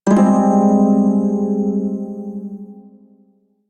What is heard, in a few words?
Shawzin Min Chord; Warframe; Prime Shawzin